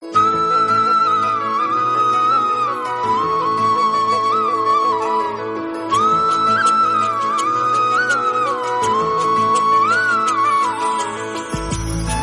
flute, ringtone

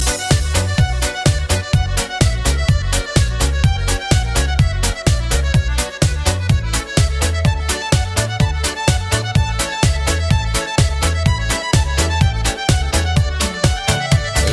russian melody; ringtone

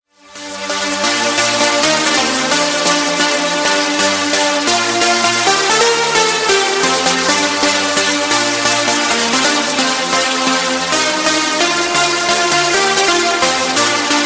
uplift trance

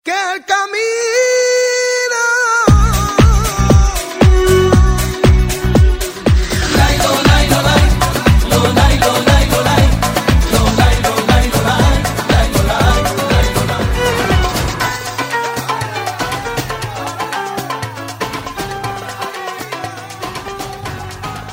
spanish arabic, ringtone

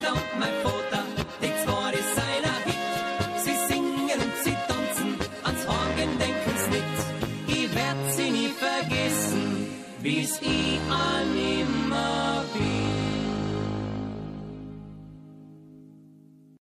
sms ringtone

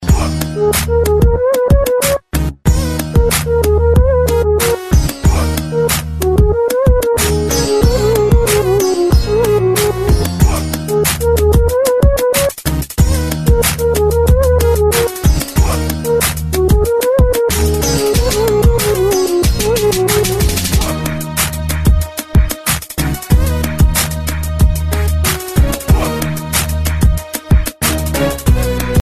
crunk arabic style, ringtone

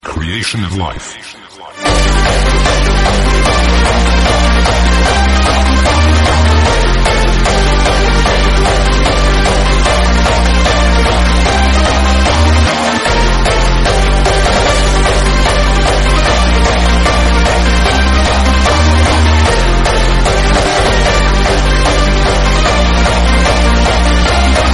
norwegian lullaby

